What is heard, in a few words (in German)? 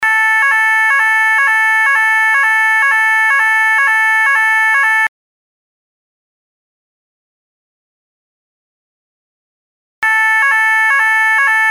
Sirene Wetterbedingte Spielunterbrechung ohne Gefahr; COPTR TUBE